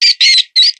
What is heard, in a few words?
mouse; rogue savage rats